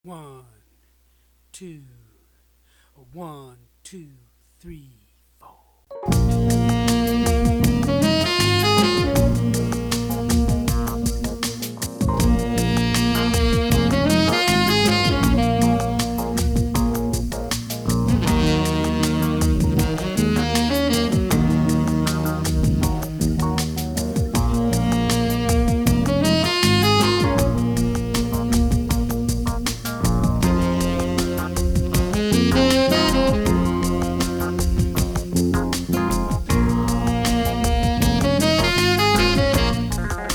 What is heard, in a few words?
pentatonic minor exercises, saxophone